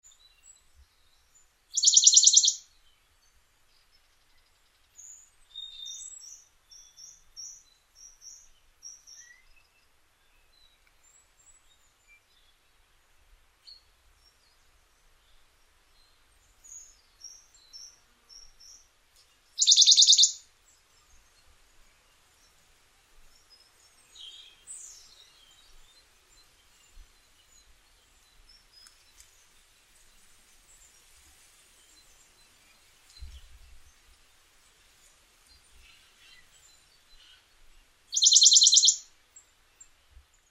bonellis warbler

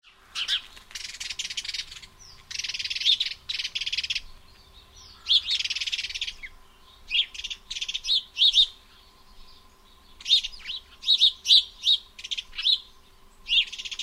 house sparrow

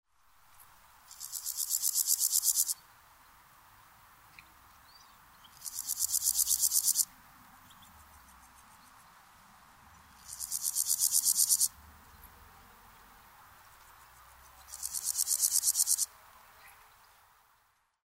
meadow grasshopper

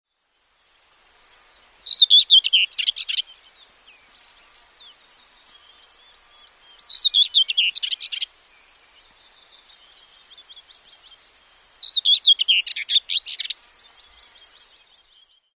whitethroat

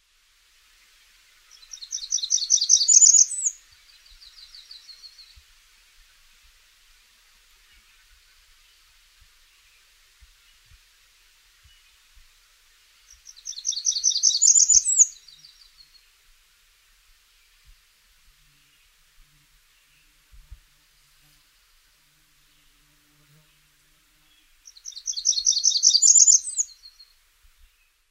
blackburnian warbler

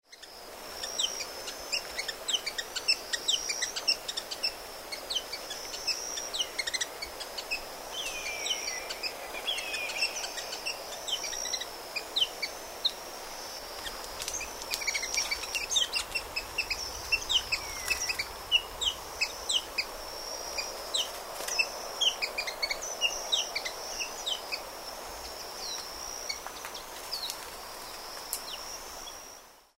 fan tailed gerygone

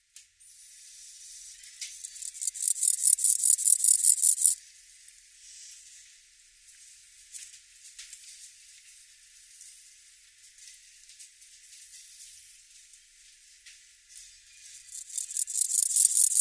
meadow grasshopper

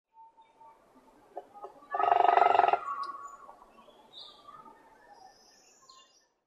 hairy woodpecker

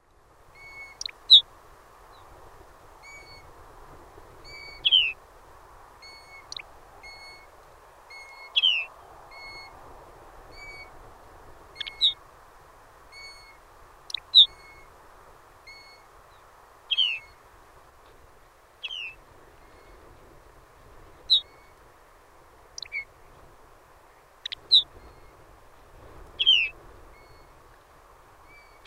lapland bunting

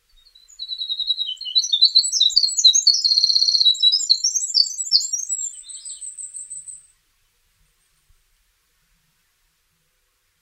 winter wren

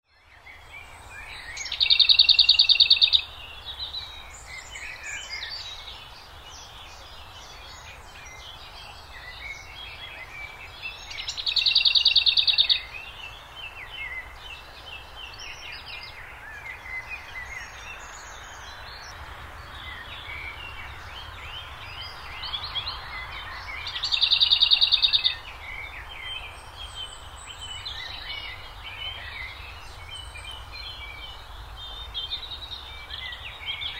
lesser whitethroat

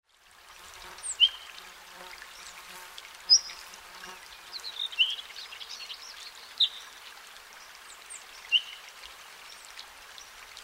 ortolan bunting

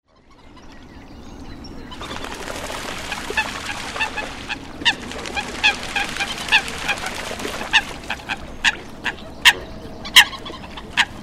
coot

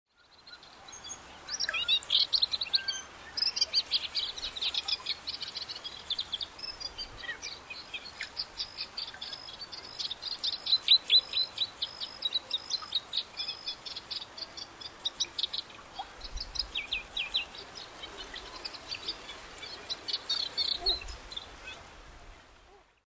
moustached warbler

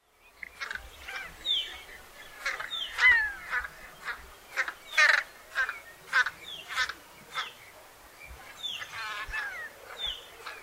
long legged buzzard

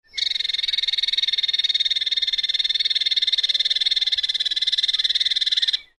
sedge warbler

